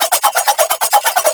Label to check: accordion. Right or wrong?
Wrong